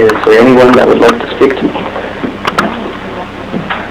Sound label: axe